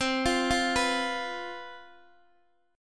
C Major 7th Suspended 4th, down, Guitar, Open D

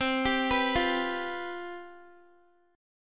C Major 7th Suspended 4th, down, Guitar; CM7sus4 arpeggiated

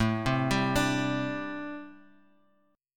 A Minor 11th A, Chord

